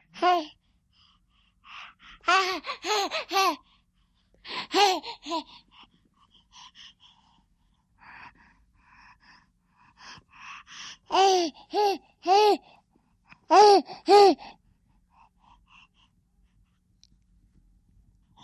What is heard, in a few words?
Happy Baby Girl Plays, twelve month old